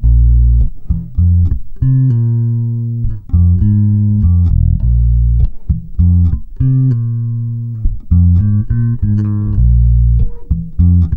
BRAZIL 100, Bass Grooves; USB Soundscan vol.30; AKAI

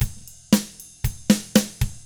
116 ROCK, Double Platinum Drums; ILIO

